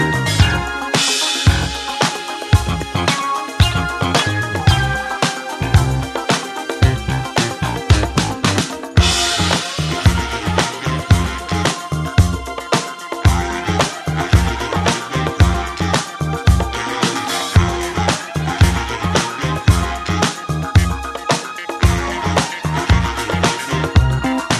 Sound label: Disco